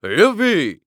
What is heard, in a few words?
Human Male Yell Yippee Drunken Yippee